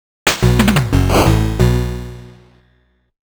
INSERT COIN (GAME START); darius cozmic collection audio disc tiat ver

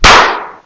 Slap; talking tom cat android ios mobile gamerip 2010

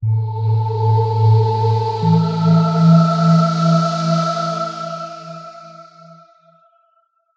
Open Door Fanfare

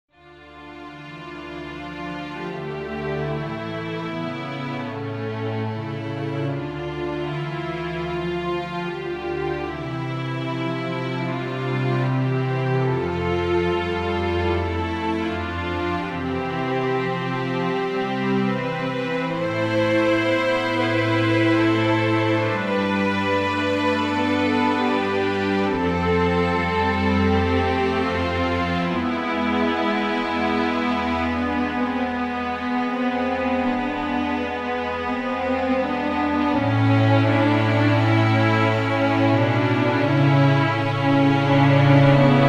Adagio in D-minor; Hearts of Iron III; Sounds of Conflict; Stalingrad

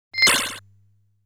Enemy Fires a Bullet

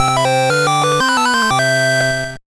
Jingle

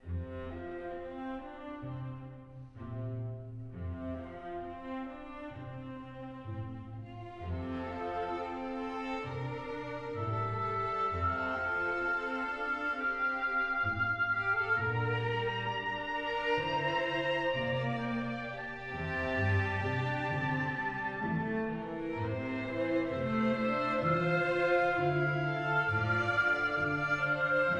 No Vocals; Assassin's Creed Syndicate

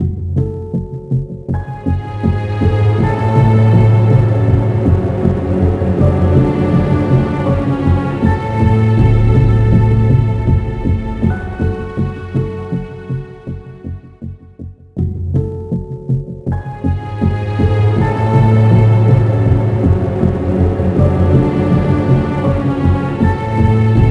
Music Building Tension; JBond

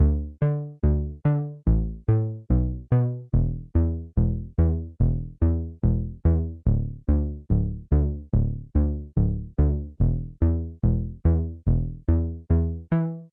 Vendor Music Bassline; laser kitty pow pow switch gamerip 2019

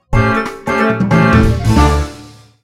Jingle Stage Complete; Kirby Fighters Deluxe; Dedede's Drum Dash Deluxe

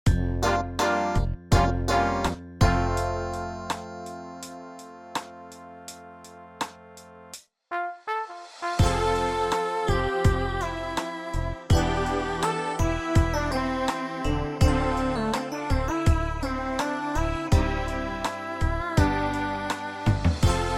Artistic Swimming Song Karaoke; Doodle Champion Island Games

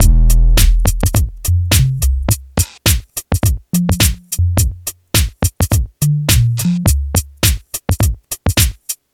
Beats beats; Frogger in Toy Town

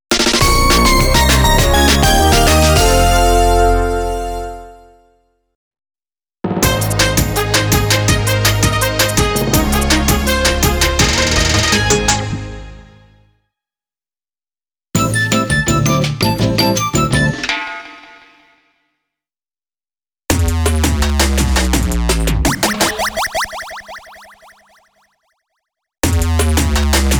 Jingle Collection; Ape Escape Pumped and Primed

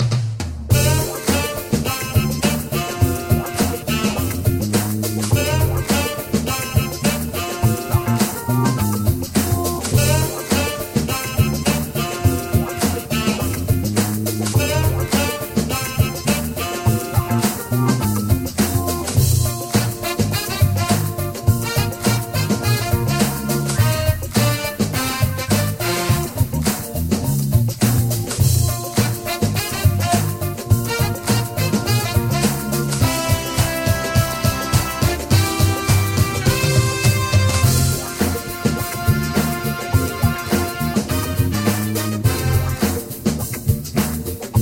bgm menu loop; X-Tactics; Android

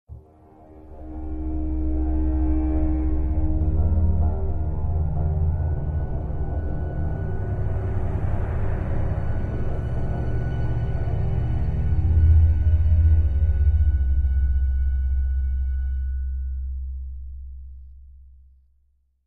Dialog; the walking dead season one

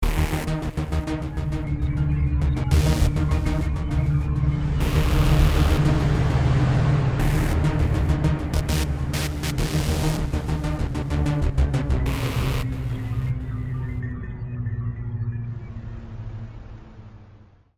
Key Derezz

